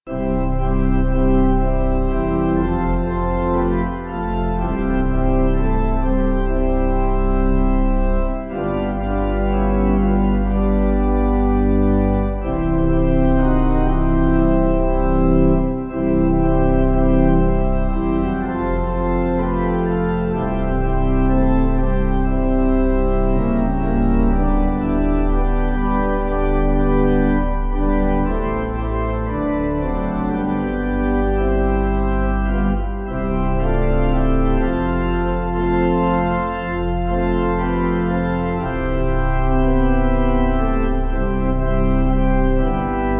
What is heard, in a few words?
Pipe